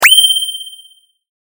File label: sword